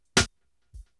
SNR

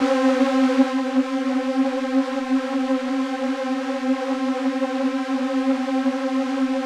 LEAD C5 NOTE, Massive; Synths; Massive - A Love Lead

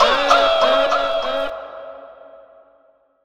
Oaahh Vox

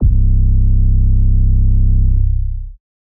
808 - C; Cymatics; Astro Butterfly

